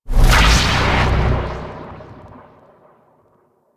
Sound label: New Absorb Cast; Warframe; Nyx; Nyx Updated Sounds